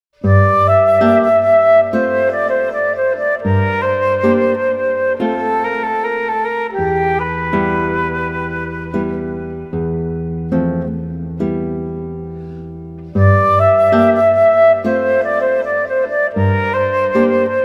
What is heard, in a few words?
gnossienne for piano